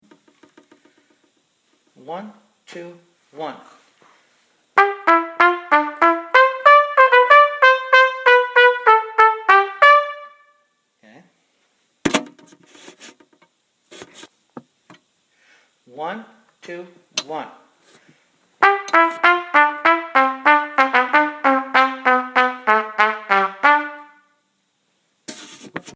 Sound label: Marche Militaire